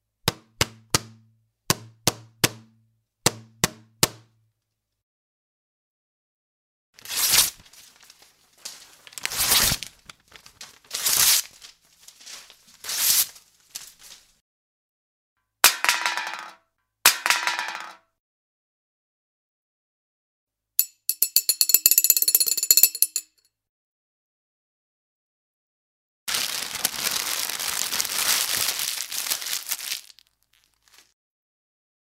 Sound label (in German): Muell Spiel Geraeuscheraten; ARA4Kids; Raetselheft